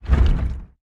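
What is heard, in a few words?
movement heavy push; smite; god atlas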